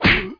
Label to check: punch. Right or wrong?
Right